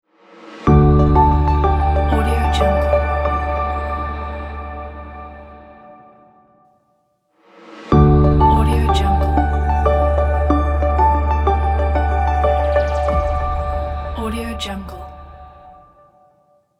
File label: medical logo; red-ninja